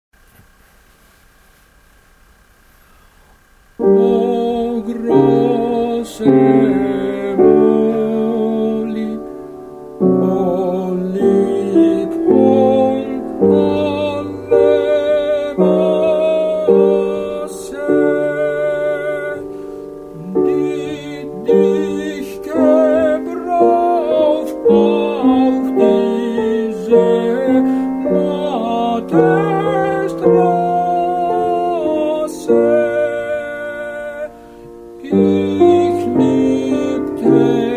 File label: tenor